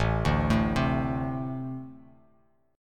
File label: A Minor Double Flat 5th A; Ambb5 arpeggiated; 5,5,7,5; JGuitar